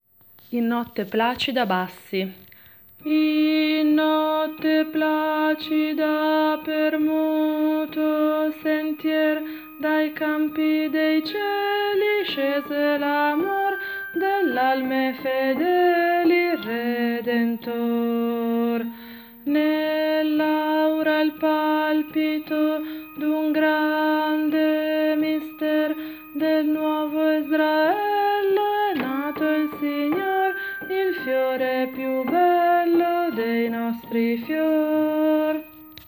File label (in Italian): bassi; In notte placida